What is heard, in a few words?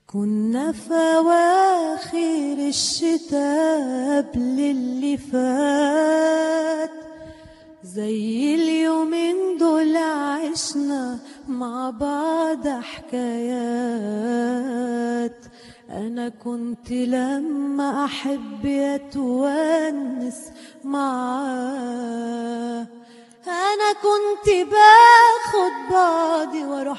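Is Calling R&B, ringtones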